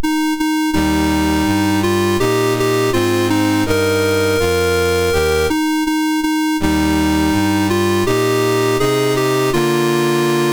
MOS6581R3, National Anthems; Kelantan

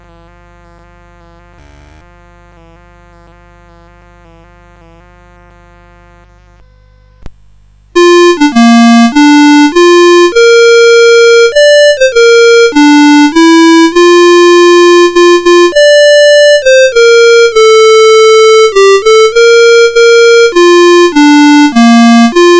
Star Spangled Banner, CSG8580R5

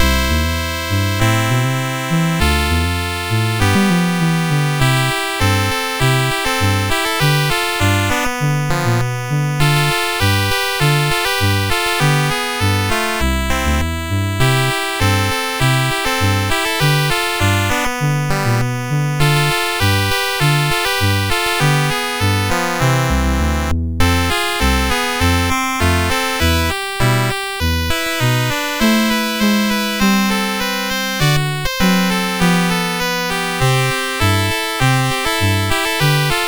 Rocking Around, MOS6581R4

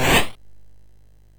sid MOS6581R2; California Games

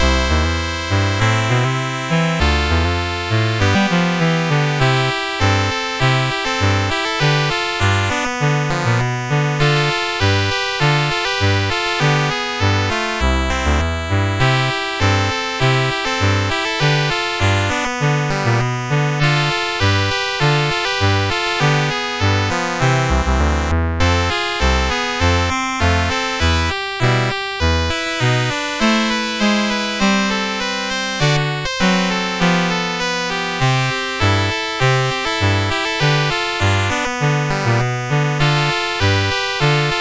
Rocking Around, CSG8580R5

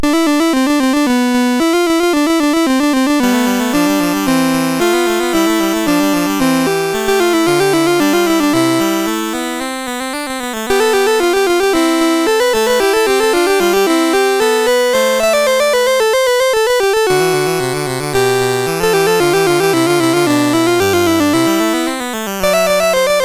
Fugue, MOS6581R3; Fugue VIII 6

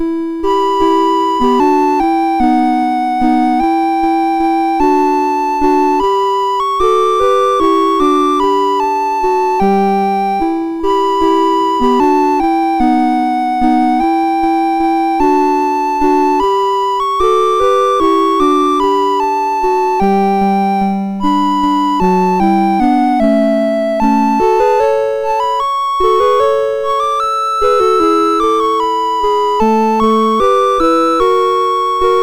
MOS6581; MOS6581R2

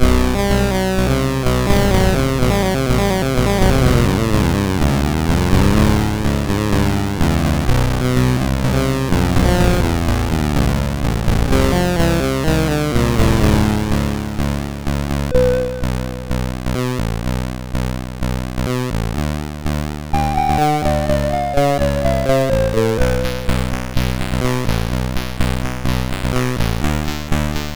sid MOS6581R4

